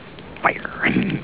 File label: fire